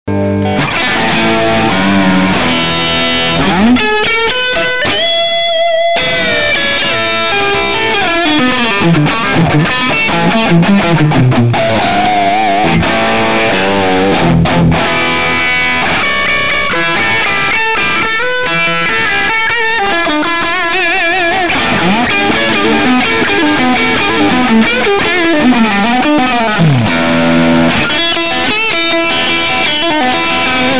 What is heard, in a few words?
AMP Noodling Around; Oodles of Noodles; Studio Devil Amp Modeller Pro v.1.0